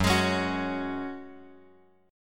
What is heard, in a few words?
F sharp Suspended 2nd; F#sus2 Chord {2 X X 1 2 2} strummed